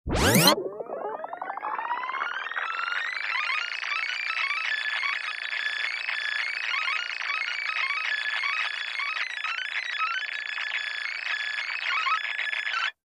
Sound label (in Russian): zvuk bystroj peremotki magnitofona